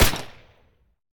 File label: Gun Shot; MiSide